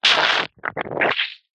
foley liquid frothy squirt squelch short dense

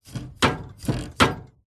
household bbq metal lid movements bangs

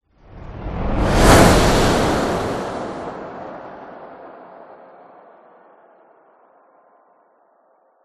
whoosh; orlando lanzini

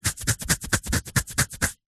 human itch skin; zapsplat

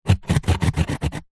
foley cardboard scratch sharp object

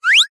cartoon slip trip high pitched fast

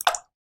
water drip; sound effects one

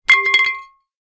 impact metal small pipe drop hard ground; zapsplat

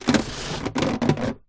household garden table plastic slide creak

